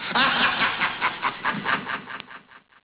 beep laughing warning

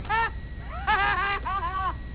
laugh; pee wee

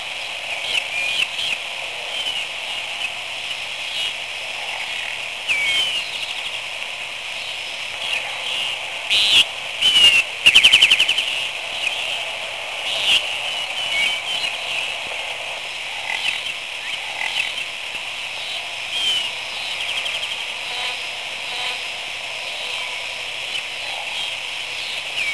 Nature Sounds

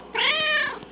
cat shorty

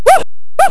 Bark

